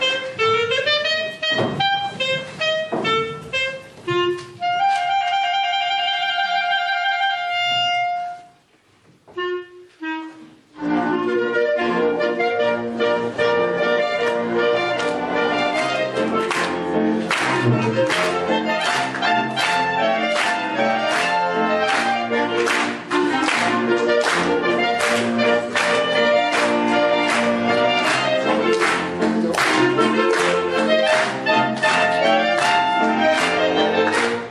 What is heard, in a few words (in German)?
Klarinettenmuckl